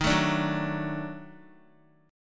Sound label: Eb Minor Major 7th Flat 5th, down, Guitar; EbmM7b5 strummed